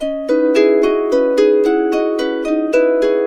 110, Complete Harps; USB Soundscan vol.62; AKAI

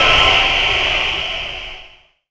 NOISE, Production Effects

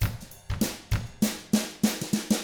FUNKY, Drums

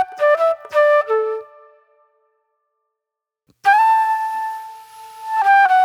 Classic Soul Flute

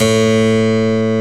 HARPSICH

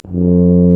tuba, brass; Solo Brass

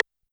Side Stick Ana, YAMAHA RM1X

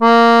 ACCORD A#2, Accordions; E-MU Formula 4000 Series Vol. 4; Earth Tones

